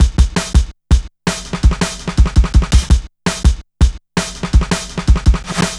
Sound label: Shuffling Live, Jungle Drum Loops